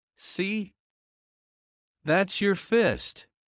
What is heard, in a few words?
Heart low; Classroom humanbody